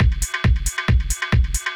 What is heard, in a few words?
Tech loop